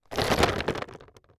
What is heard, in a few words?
Clunky Rattle Short; Foley